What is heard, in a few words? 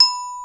Orch Bel B4; Digital Sound Factory Virtuoso